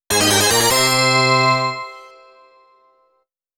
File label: Rank Up Fanfare; lagrange point soundtracks returns